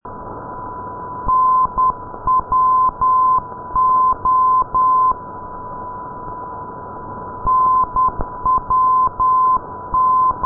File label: ndb oksywie; kiwi